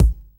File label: facial, Kicks Medium